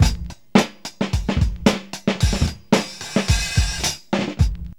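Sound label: 110 bpm, Funky loops; Zero-G; Funky Elements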